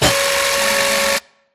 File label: Screen Glitch Long; Boomtown; Titanfall 2